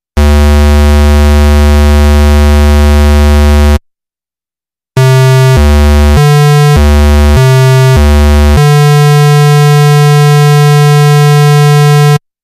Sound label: Nord Lead 3 Raw Square Wave; Nord Demos; Nord Lead 2 versus 3